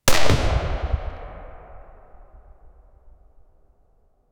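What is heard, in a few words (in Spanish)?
explosion de polvora seca; powder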